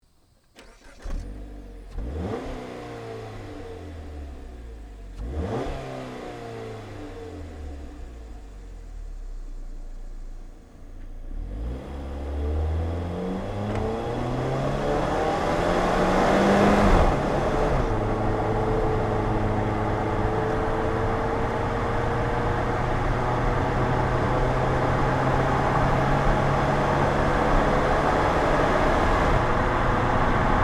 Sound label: Jeep Patriot